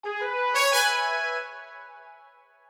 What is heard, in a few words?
Reward; gokulTheme